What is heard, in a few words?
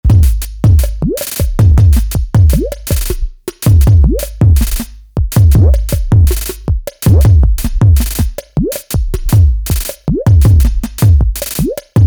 Beat; Five12